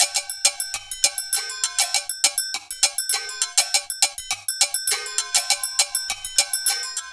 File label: Bell Latin, Percussion Loops